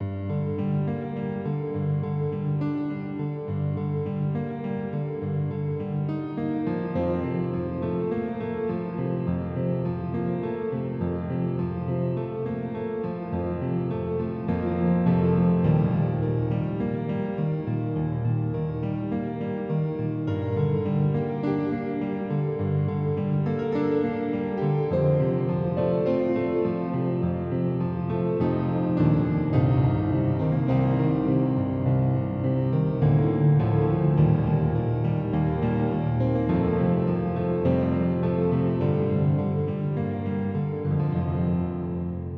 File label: Piano